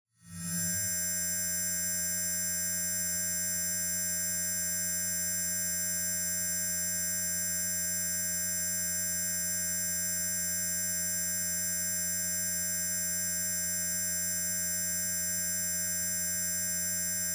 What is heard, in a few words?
Laser Beam Ray Feedb, laser fx